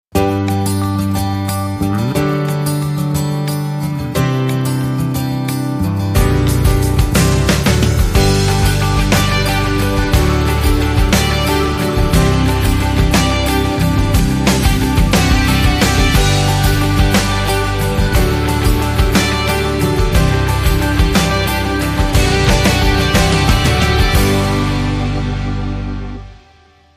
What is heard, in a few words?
Guitar